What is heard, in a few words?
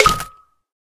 Egg Tap Wood; Sfx - Prize Egg Tap Wood 02